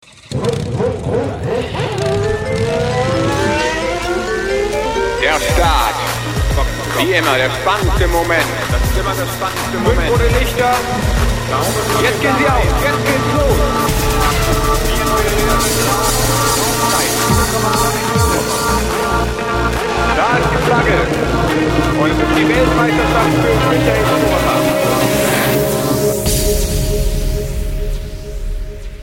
Race Car Tone

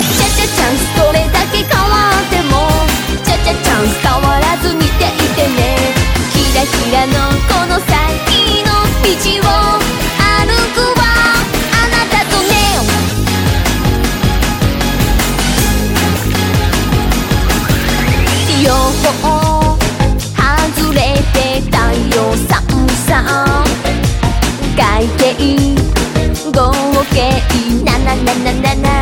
Cute Song

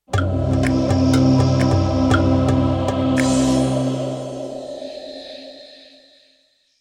Ubuntu Startup

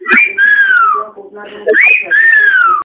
Whistling

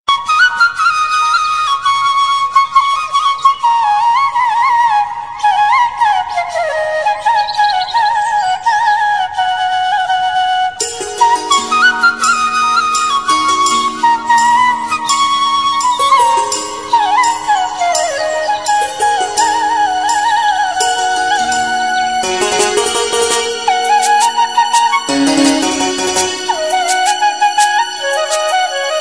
Piano Bansuri Mix

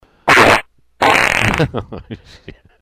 Loud fart

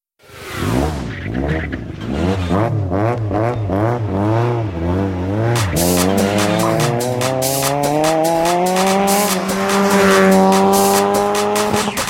Tuning

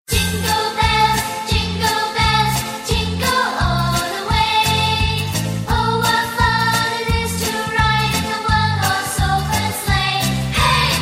Jingle Bell

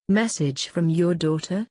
message; Daughter

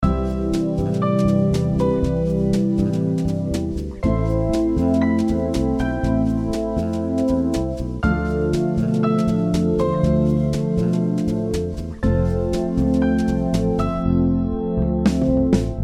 Jazzy

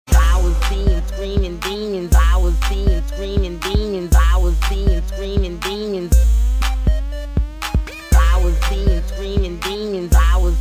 Screamin Demons

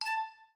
Sonata Ding

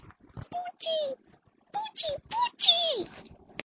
Very Cute Sms

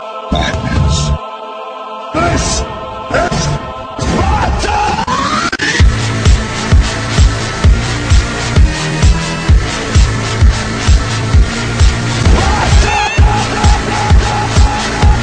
Techno; Sparta

